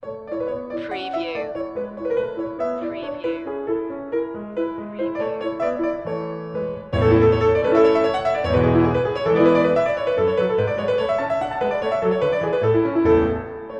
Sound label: piano sonata no11 a major kv331 1st movement variation 1; wolfgang amadeus mozart; classical music for media